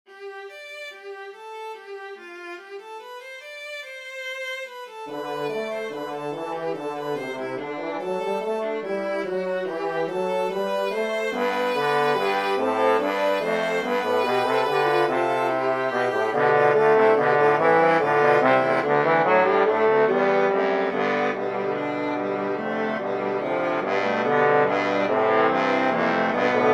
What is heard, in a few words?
Mixolydian fugue 12edo